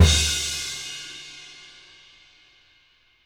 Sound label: Living Drums, JZ CRASHES; Sampleheads; Peter Erskine